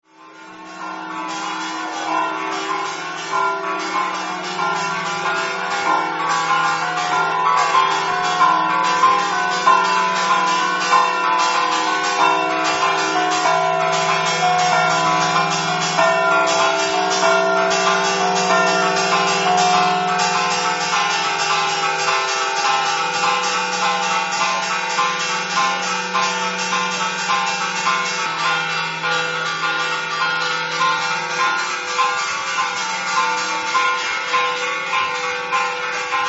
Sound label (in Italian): rozinca, campane